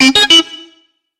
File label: beep